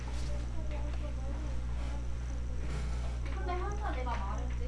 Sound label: kick